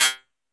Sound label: PERC, Drum & Bass, 160 BPM; Ueberschall; Drum & Bass Flash Trackz